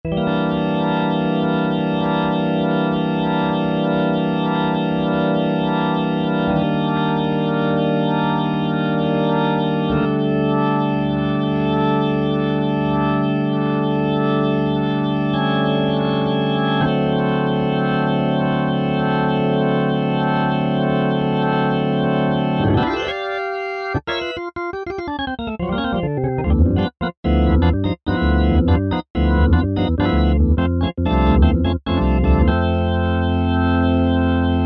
Small Rotary; IK Multimedia; SampleTank Free

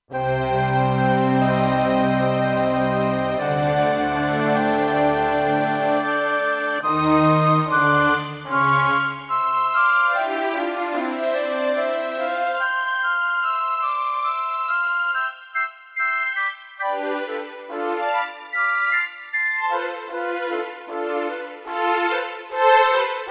Threeway Xfade, Kurzweil K2500X

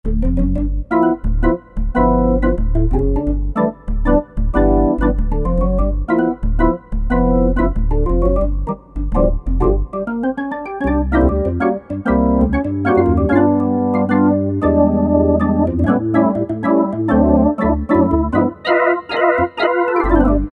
B3 Rotary, Drawbars

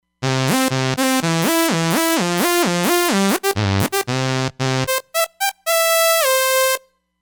Dirty Saw, Roland Fantom XR

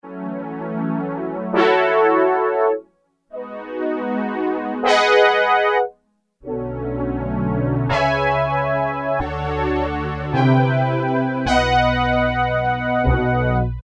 String-Horn X-Fade, Roland MKS-70

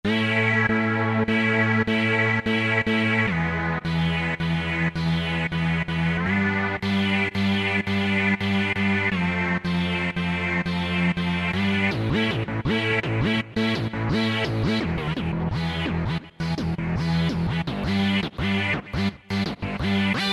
Dance Str Sect, Roland SRX-05 Supreme Dance

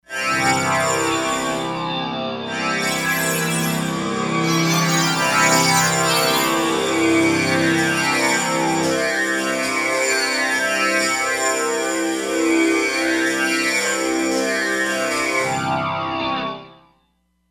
Tamburas, E-mu Proteus-3; Factory patches

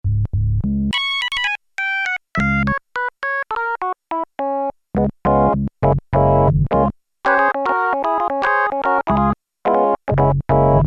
Organ, Korg Poly-61M

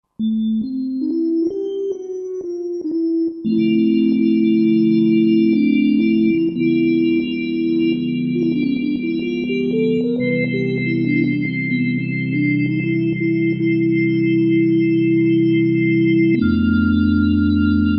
Ballad; '60s & '70s; Felix Ballad; Roland SR-JV80-08

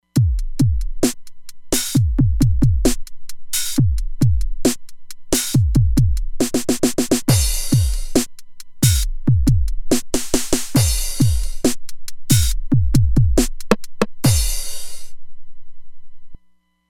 ArtificalKit, Roland Fantom XR Drums

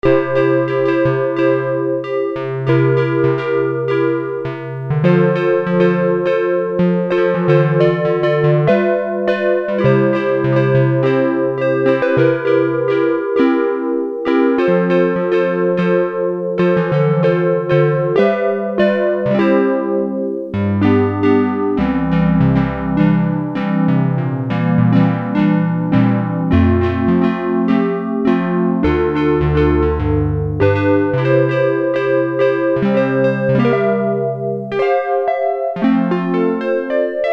Wurlie Electric Piano; Sequential Circuits Prophet-5 (Ver.3); Factory Patch; File 3 Programs

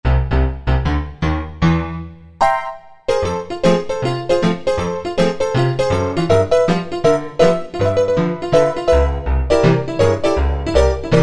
AP Hammer, Yamaha TG77; Factory Presets

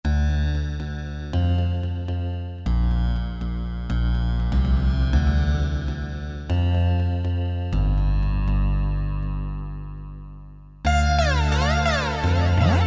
Dark Echo Bass; Roland SRX-05 Supreme Dance

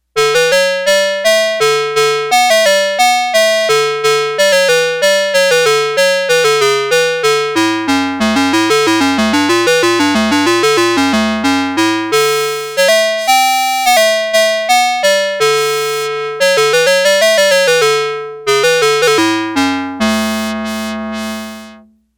Pompous 5th Brass, Arturia MicroBrute; Factory patch sheets

